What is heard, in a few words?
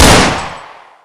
usp